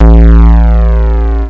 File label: Spawn; Glypha III